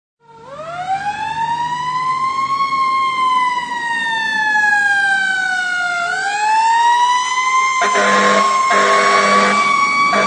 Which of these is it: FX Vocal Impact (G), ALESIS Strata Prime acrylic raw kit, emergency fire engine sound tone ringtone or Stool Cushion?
emergency fire engine sound tone ringtone